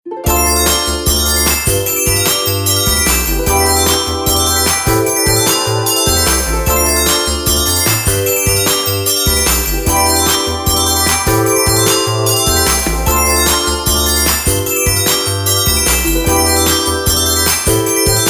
Sparkles, ringtones; ZTE ZW20 Verizon Gizmowatch 2

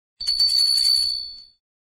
Wind tone; Samsung SGH-D788

